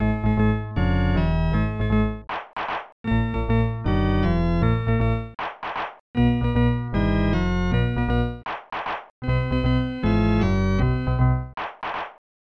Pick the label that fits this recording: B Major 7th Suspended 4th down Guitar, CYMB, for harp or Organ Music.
Organ Music